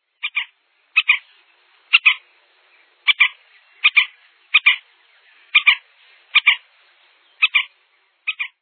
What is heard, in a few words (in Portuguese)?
joao chique chique